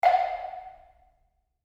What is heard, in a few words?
wood block single hit